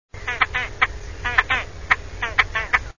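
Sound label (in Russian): Poganka bolshaja, Great Crested Grebe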